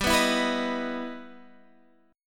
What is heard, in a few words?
E Minor Major 7th G